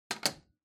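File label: Soroban Put, abacus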